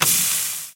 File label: energy meat, Guns, Hits; civ13; civ14; Weapons